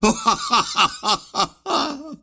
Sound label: wheel laugh; ringmaster